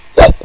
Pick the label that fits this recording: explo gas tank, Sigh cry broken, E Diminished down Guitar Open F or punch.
punch